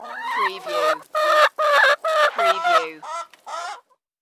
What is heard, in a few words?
Chickens Squawk